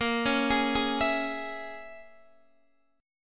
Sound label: Bb Minor 7th Flat 5th, Guitar, Open D; x,13,11,14,11,14